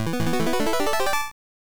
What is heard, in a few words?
Door Opens; milon s secret castle nes